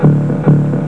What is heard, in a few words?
Button Click; Imperialism Demo